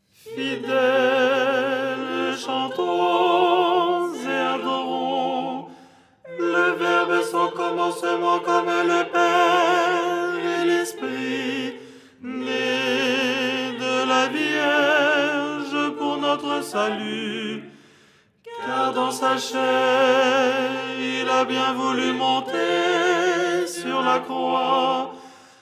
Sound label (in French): tenor; Tropaire du dimanche; Les 8 tons en français